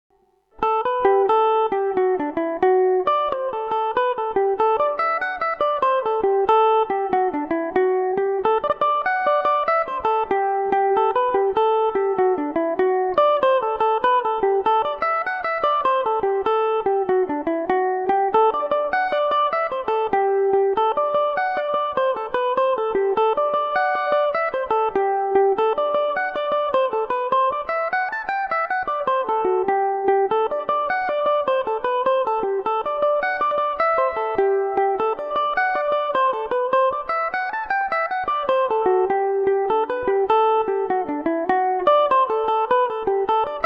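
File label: G major revelation, Irish mandolin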